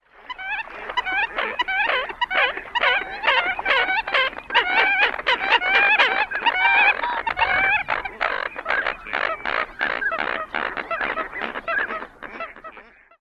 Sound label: call variant